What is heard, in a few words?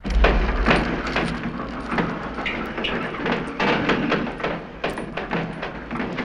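Metal Creaks Movement, gate & big rolling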